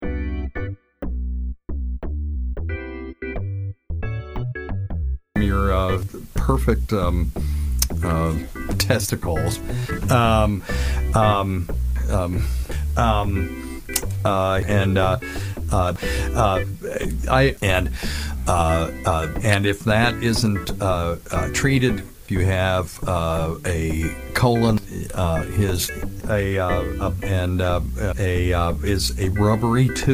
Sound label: AHHHHH SONG